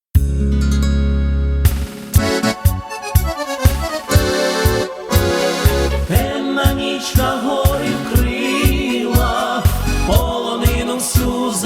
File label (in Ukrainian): ukrayinski vechornitsi gutsulka